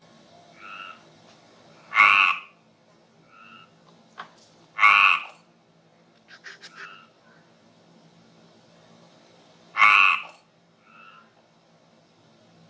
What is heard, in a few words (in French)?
chant rainette meridionale